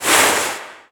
Dolphin jump

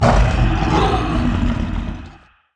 Destroyer Roars; 魔兽争霸3; 亡灵; 毁灭者的怒吼

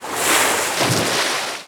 jump; Dolphin

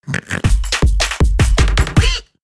Pig; Mother Witch

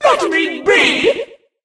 mon hurt vo

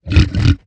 Minecraft hoglin idle; 疣猪兽; 我的世界

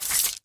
reload sfx; angelo

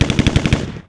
Gyrocopter impact hit

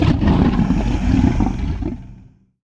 Destroyer Roars; 黑曜石雕像（魔兽争霸3）; 亡灵; Obsidian avenger; 毁灭者的怒吼

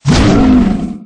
stone golem deploy

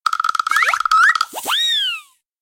Goblin Giant Afraid

